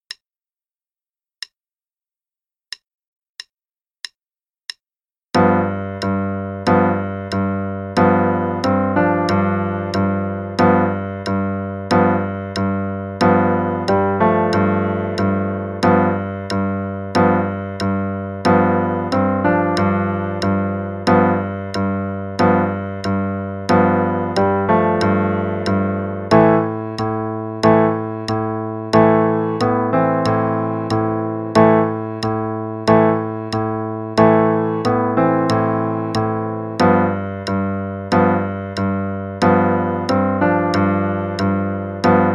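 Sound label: Dinosaur Dance Improv (quarter note=92); Level 1 - 2nd Ed; Faber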